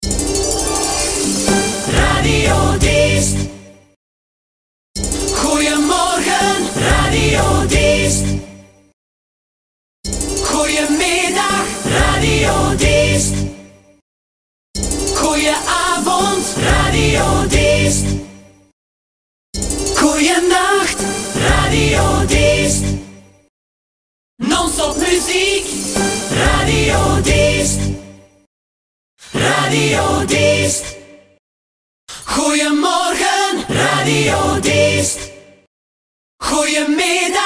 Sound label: jingles; Diest